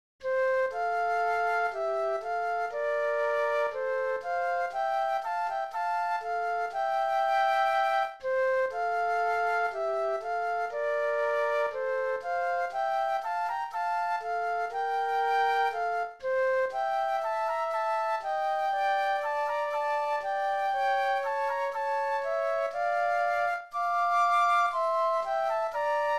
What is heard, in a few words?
Flute Duet; classical